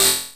enemy shot; mm7sfx

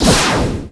ROCK

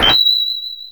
DING; mm7sfx

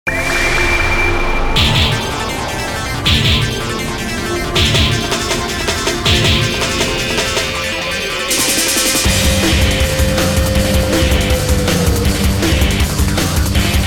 Promotional Mix (Trailer Theme); Mega Man X OST; Maverick Hunter X

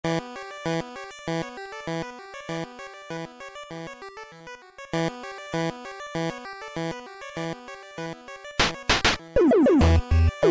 Suspense; Mega Man 9 (NES)

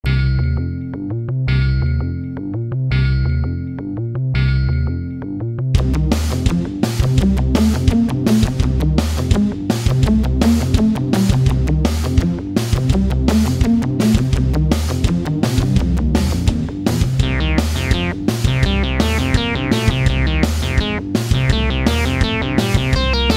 Music Cover; Genesis; Shadowrun Genesis

